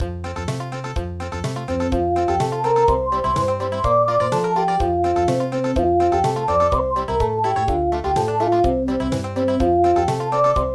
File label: Country; dwarf muku